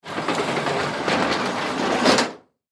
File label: Hospital Garbage, Hospital Garbage Shoot Close Door; Emergency